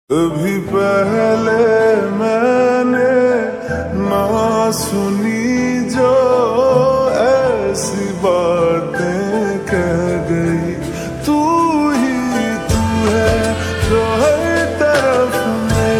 Slowed Reverb

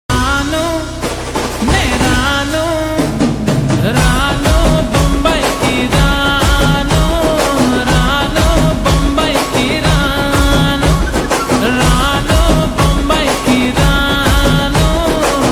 Slowed Reverb; Telugu

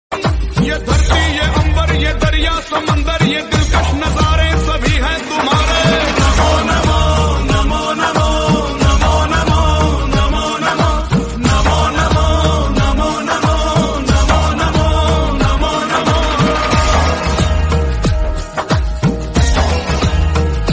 Ringtone

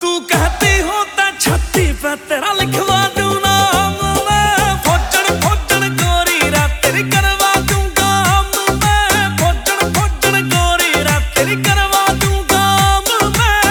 Ringtone

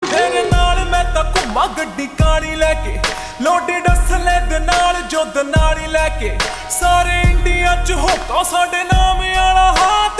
Slowed Reverb

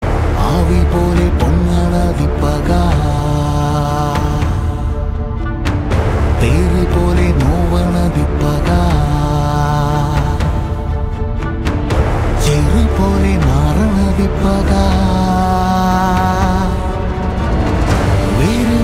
Ringtone

